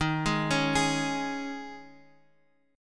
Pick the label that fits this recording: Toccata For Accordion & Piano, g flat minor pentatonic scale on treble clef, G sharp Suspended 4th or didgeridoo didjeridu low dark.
G sharp Suspended 4th